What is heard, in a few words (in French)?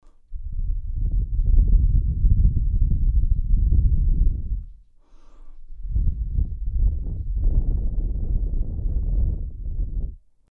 Defaut sonore bruit du vent